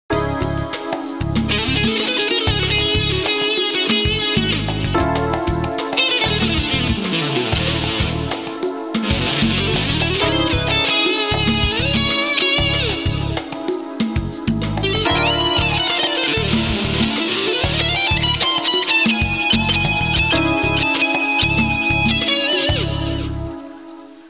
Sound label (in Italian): suonerie musica jazz